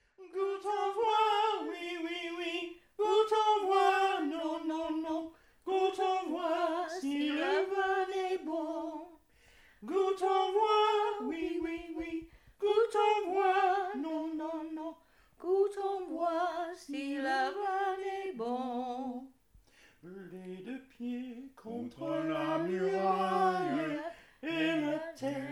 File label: singsong; Auquainville; Holiday 2001 in France